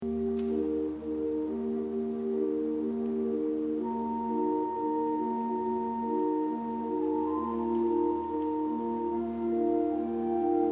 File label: Organ; Organ Master Works; widor